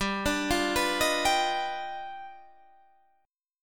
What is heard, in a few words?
G 7th Flat 13th; arpeggiated; JGuitar